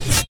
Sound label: Release, Blade Pull-Release